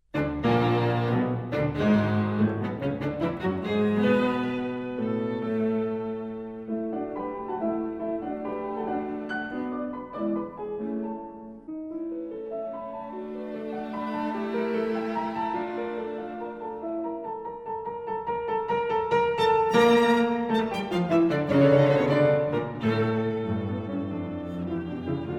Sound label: piano trio; kiel